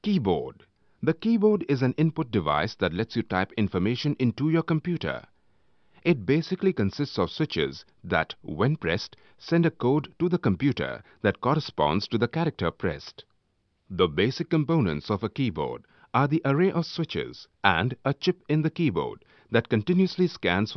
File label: keyboard; workshop